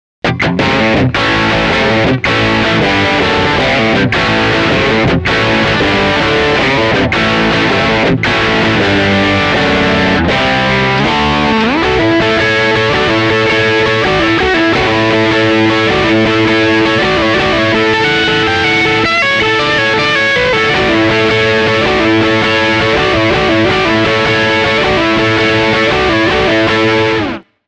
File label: AC30, gitar